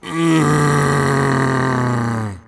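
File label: zombie die; DAoC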